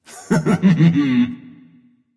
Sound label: tounge; smite; voicepack; janus